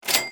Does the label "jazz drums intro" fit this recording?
No